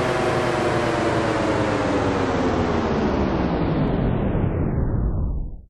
elevator stop; elevatorparts

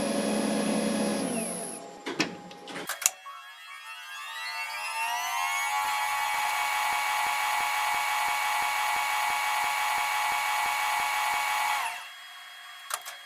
maybe vcr

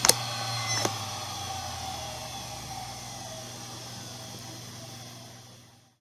hdd stop; digistuff nbextras

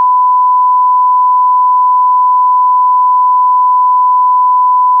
sine; digistuff

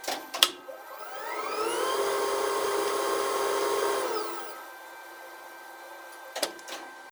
maybe vcr

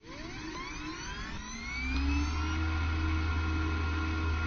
centrifuge start slow

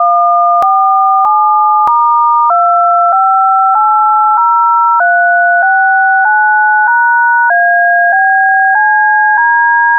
dtmf columns

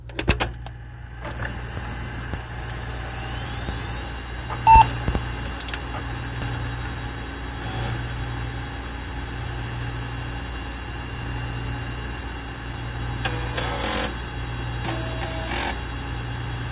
new hard drive; duronbox

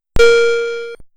low chime

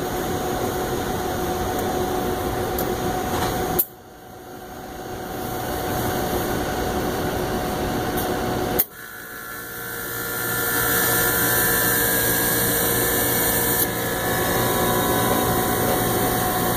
icemachine

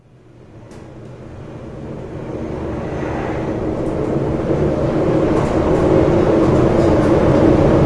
elevator start; elevatorparts

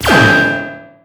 laser; dreambuilder modpack; technic

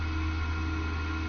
centrifuge run slow

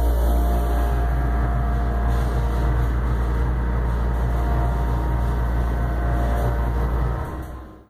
up stop, elevator; menards

